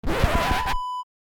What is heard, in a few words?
record scratch